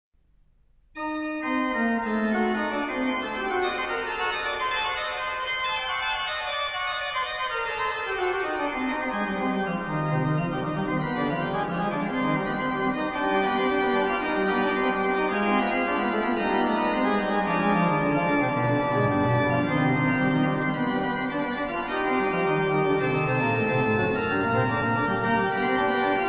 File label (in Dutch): fantasiesonate in c allegro impetuoso